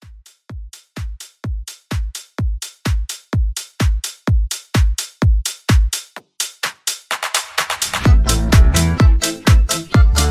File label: House Mix 127